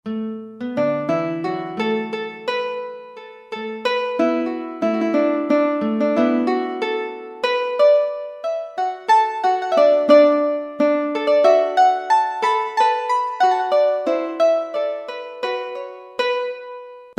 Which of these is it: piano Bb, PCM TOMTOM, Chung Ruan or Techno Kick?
Chung Ruan